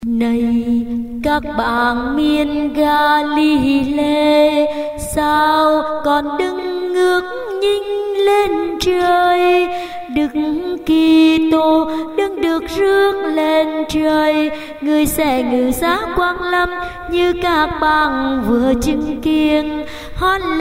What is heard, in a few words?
alto